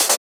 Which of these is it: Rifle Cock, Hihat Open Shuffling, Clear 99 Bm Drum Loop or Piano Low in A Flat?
Hihat Open Shuffling